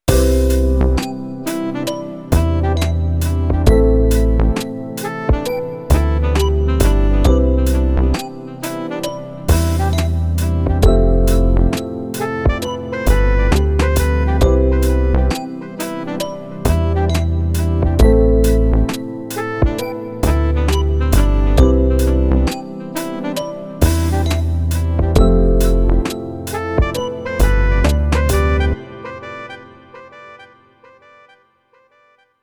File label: lofi melody